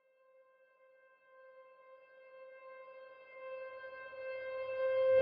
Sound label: Piano